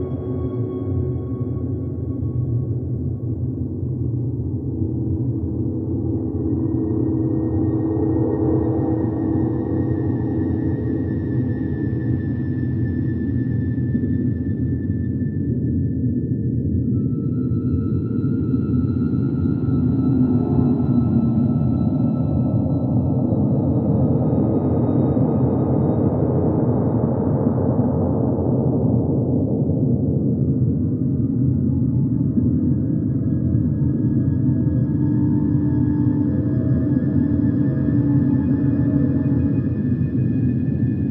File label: Light Containment Zone Ambient